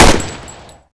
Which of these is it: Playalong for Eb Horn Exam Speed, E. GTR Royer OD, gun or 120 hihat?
gun